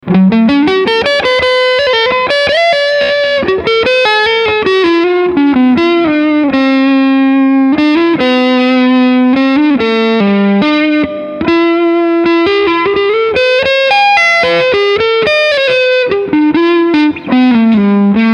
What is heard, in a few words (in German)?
epiphone casino coupe hals p90 overdrive